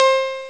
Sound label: rich chord; Amiga Soundtracker Sample Packs